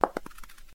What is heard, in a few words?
dice roll; Dice World